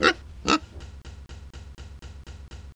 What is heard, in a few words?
pig; Seer; GamigoNA